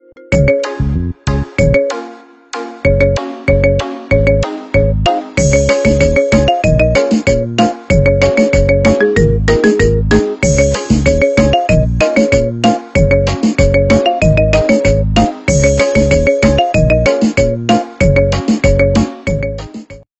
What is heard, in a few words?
Marimba